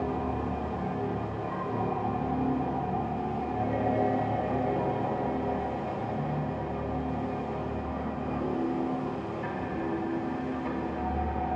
Nightmare Loop; fa battlemaps

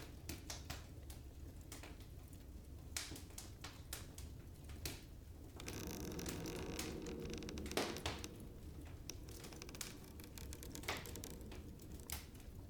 indoors, ambience; pf2e; hells destiny

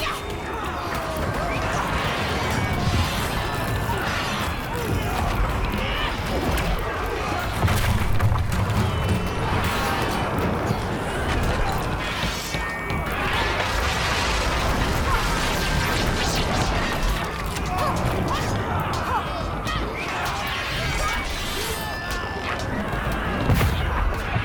battle for the docks, ambience

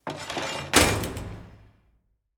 close, doors jail

